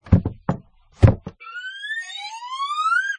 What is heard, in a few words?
Circus Room Clown Box